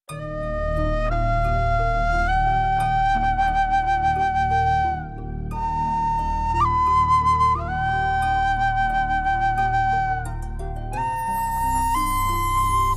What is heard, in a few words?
little krishna flute